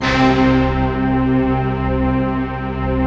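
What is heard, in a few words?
staccato orchestra, C3; Best Service; Advanced Media Trax Modern Composer; STACCORCH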